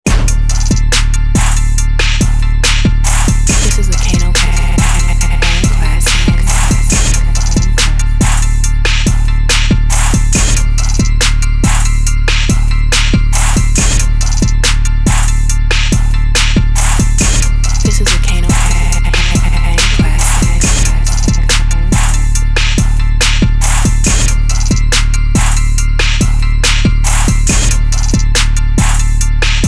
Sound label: Instrumental; MPC